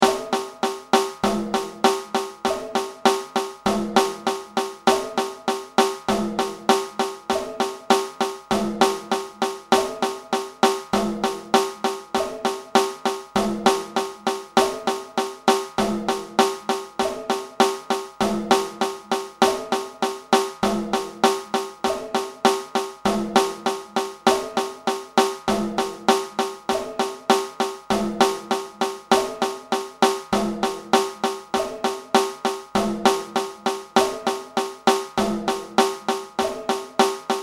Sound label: Bossa Nova slow